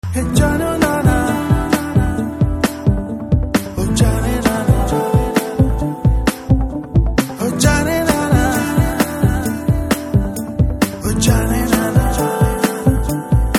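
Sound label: background Tone Telugu Ringtone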